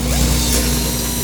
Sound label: assimilate windup; apocalypse